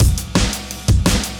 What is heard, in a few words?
Drum loops, Boombap